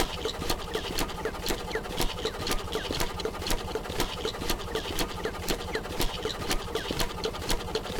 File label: steam engine loop combi clean 120bpm; Sonniss GDC